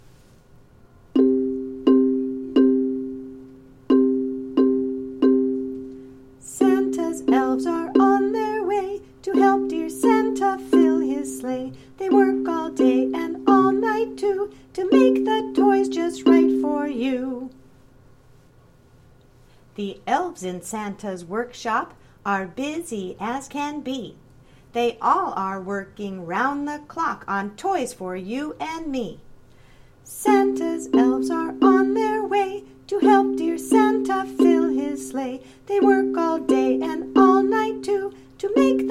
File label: Santas Elves with vocal